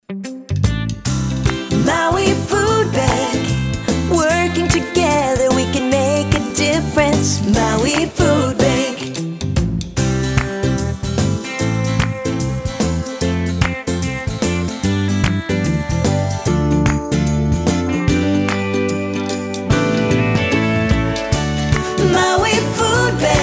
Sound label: Food Bank, community jingles; Maui